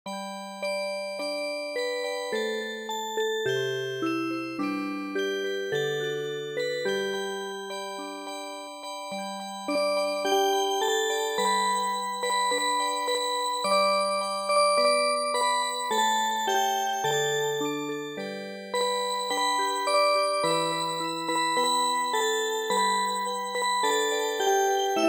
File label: Music Box, traditional; Tea picking